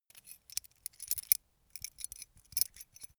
metal keys sparse handling

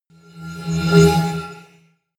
sweep

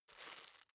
plastic bag rustle slow

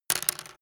pencil drop

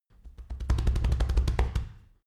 monkey chest thumping

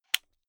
unplug safety plug soft

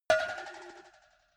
cowbell move soft

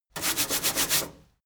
mechanic sink brush

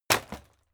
impact sweetener wood shatter medium

